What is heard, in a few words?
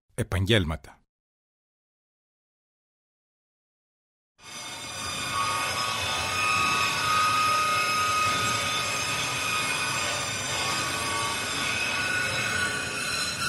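carpentry workshop; Epaggelmata; Ksyloyrgio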